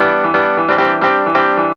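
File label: piano riff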